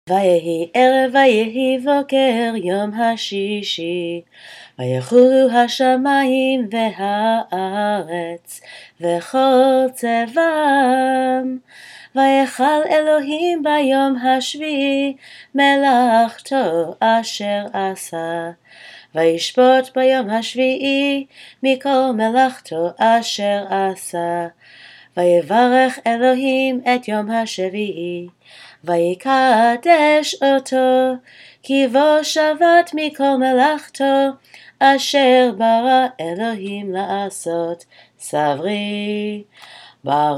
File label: Kiddush for Friday Night - female voice; friday night at home